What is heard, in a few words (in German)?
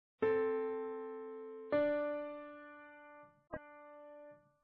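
verdeckte Oktave; Bedingt Erlaubte Parallelen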